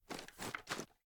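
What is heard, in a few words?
reload quick movement; gmod; viper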